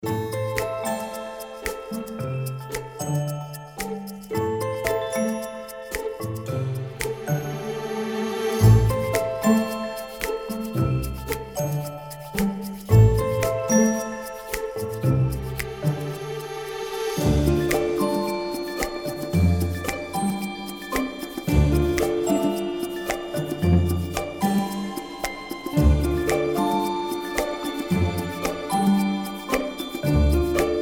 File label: Suspense Comedy